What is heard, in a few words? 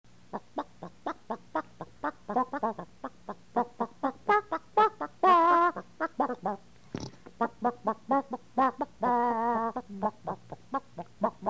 CHICKEN